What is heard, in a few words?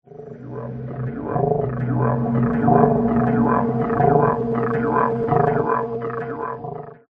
monster warning